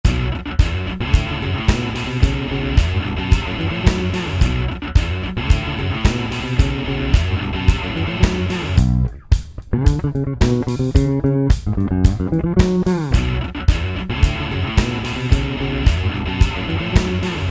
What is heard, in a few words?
Beat